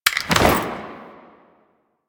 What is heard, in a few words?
Invisibility Throw; Warframe; Quests; The New War; Drifter; Abilities